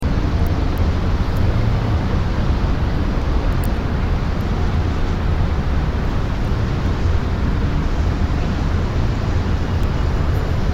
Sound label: bridge after